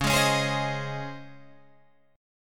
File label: C sharp Major 7th Suspended 2nd C sharp, Guitar Drop D